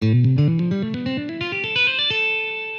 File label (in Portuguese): Estudo de ligados 170bpm; guitarbattle